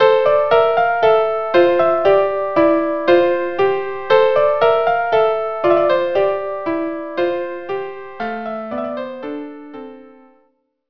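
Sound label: violin viola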